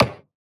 Synth Perc, Dubstep; Percussion; Drums; One Shots; Cymatics; Dubstep Starter Pack

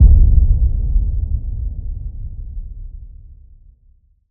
Apocalypse Impact; FX

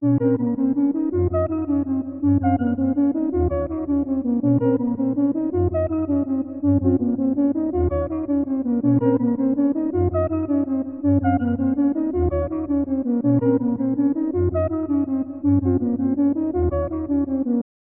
109 BPM A# Min Main Melody; Loop Stems; Cymatics; 2022 Melody Collection Platinum Expansion - Pt 1; Finish Line

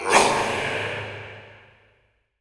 Processed Battle Shouts, Viking Vocal

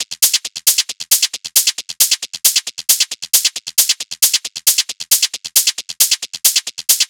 Hat Loop 135, Techno; Loops; Drums; HiHats; Ghosthack; AC2024 - Day 1 - Techno Sounds 2025

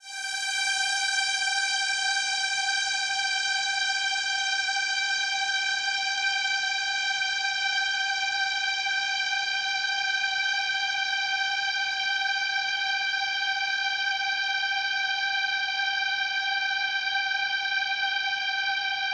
Epic Ambience - G; House; FX

